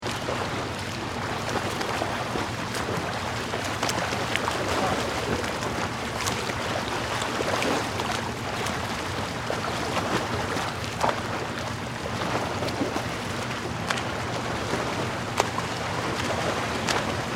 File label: Beach